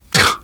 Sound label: negative noise, survivor voice, biker